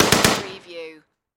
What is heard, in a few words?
Machine Gun STG44